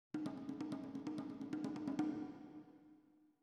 Perc

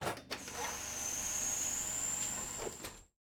Hub Train Door Open L

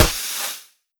Nature Hit; Legend of Keepers

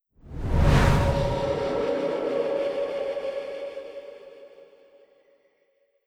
Camp Fire

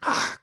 Attack

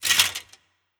Armour

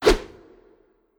Threat Swoo

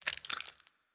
Servant move Bones

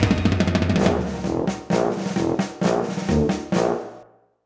Gameover; The Act; Arcade, iOS, MacOS, Mobile

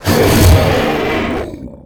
Mud Monster Death Roar; gamerip; Mars Sortie

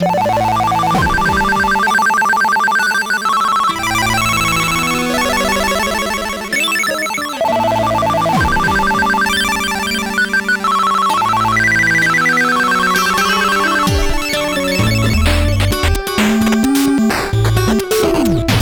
8bit BGM

